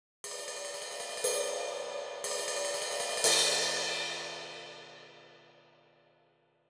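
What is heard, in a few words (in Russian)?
Tarelka odinochnaya, single cymbal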